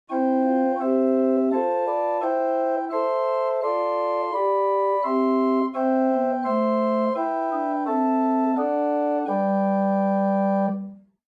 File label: Spitzflote 4, organ; Buzard Opus 3